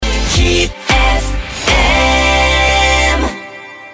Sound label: jingle hit fm romania